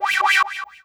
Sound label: Synth Hit G